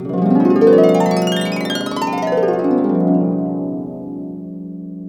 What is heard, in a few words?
HRP GLISS; Roland - LA Composer vol. 1